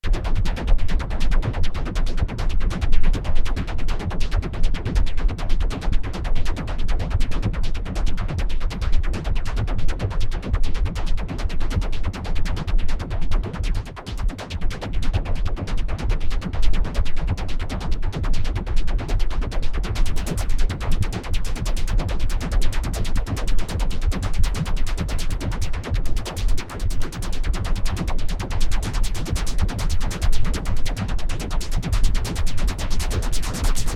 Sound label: noiser